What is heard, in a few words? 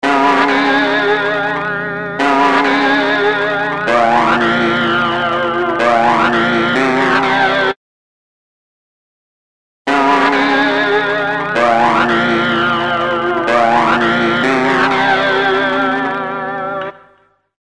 guitar, industrial